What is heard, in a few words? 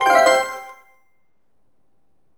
MAP BONUS; maimai